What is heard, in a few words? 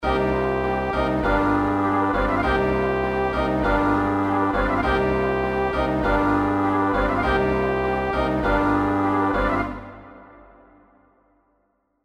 MIDI; l'enregistrement MIDI (joué 2 fois); orchestration